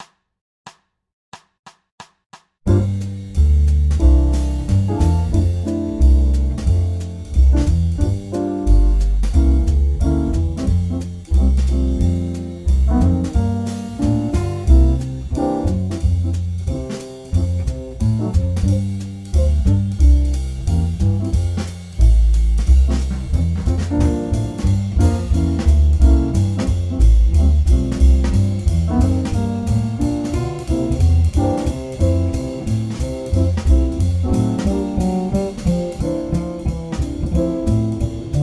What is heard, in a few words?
Gm7 - C7 - FM7 (4 bars Loop) - 180